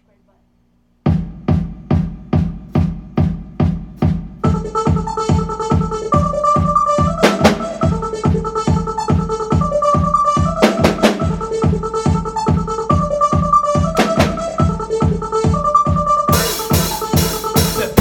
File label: Techno; rockbandacademy